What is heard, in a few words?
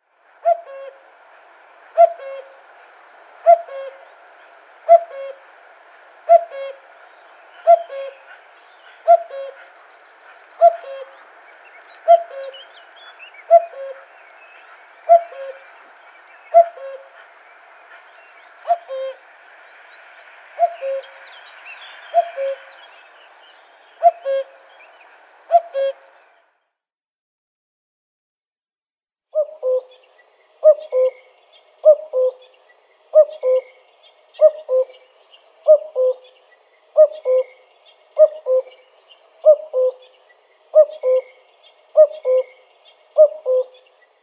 Common Cuckoo male display